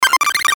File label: Glitch Squeaky Beeps, Glitch Garbled Tones; Glitch Sound Effects; Production Element Imaging Element Accent Transition